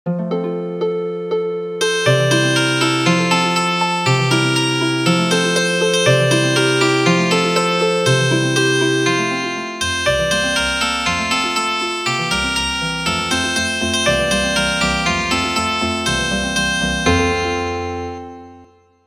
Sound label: cheerful Child German Healing Harp